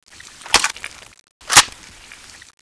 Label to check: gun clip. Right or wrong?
Right